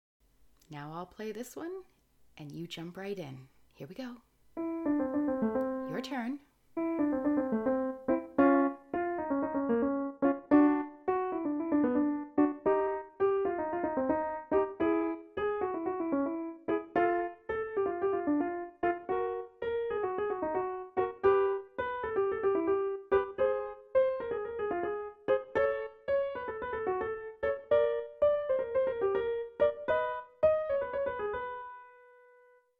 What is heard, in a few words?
jazz even faster